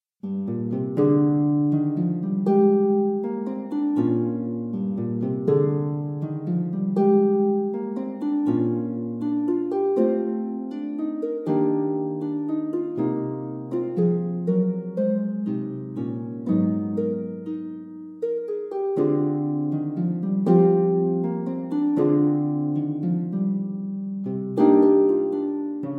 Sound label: solo lute; PANTING; compositions for solo lute vol 1